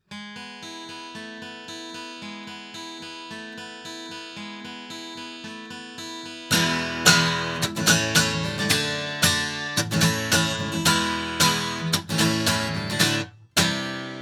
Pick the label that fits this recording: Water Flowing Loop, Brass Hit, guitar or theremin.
guitar